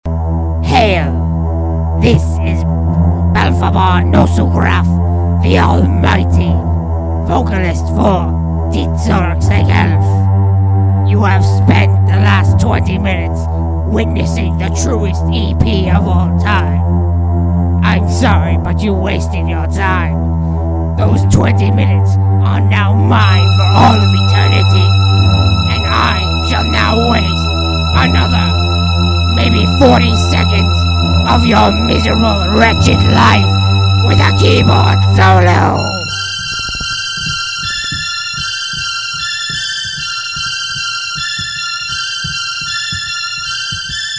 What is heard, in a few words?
Keyboard Solo